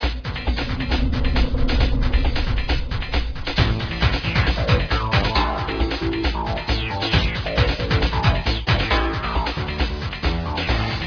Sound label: noise